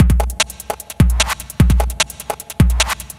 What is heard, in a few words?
150, Drum Loops; SureDean; M8; Electronisounds